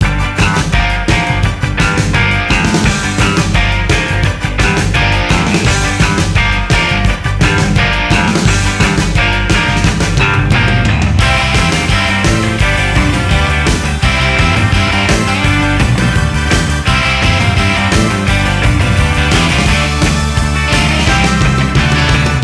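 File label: Music, Nintendo DS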